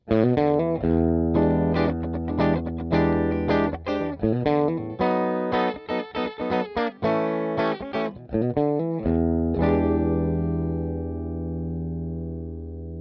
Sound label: Clean G50 33m, Clean G50 Cable Tone 33m; Line 6 Relay; wireless pentru chitara de la Line 6